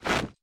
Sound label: movement step; smite; odin